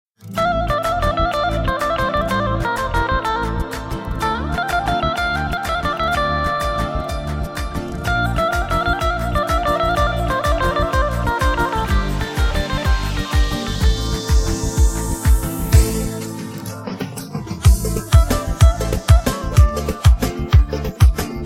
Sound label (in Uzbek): Gitara